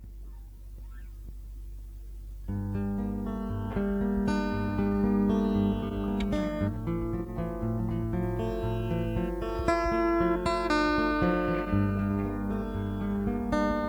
Guitar Live

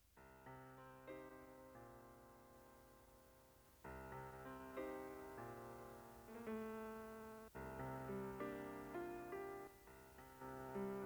Piano Solo

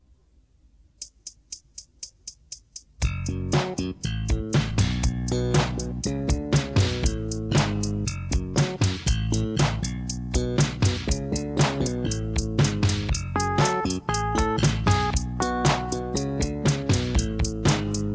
Funky Slap Bass; tape

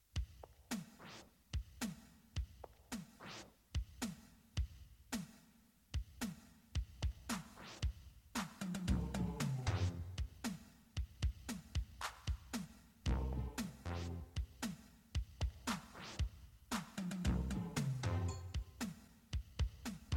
Footsteps Space Melodies

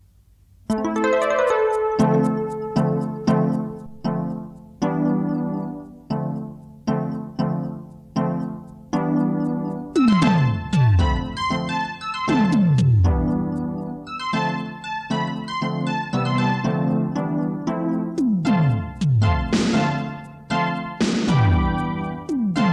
Piano Sweet At End

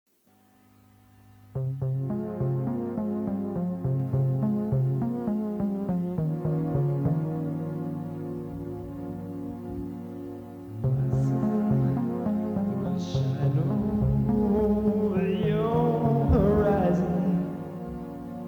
Ballad

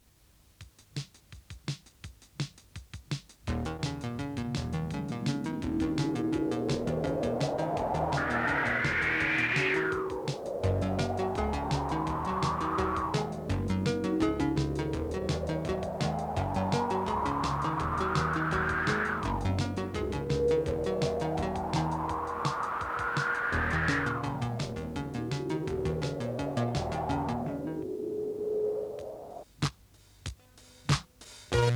Guitar Rythm Pluck Solo; tape

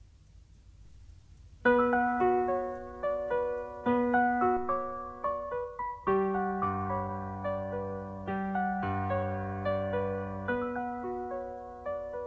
Piano And Ice Rain

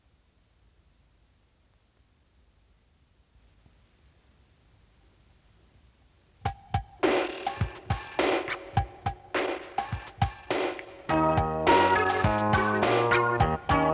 Funky Bass Pan Flutes

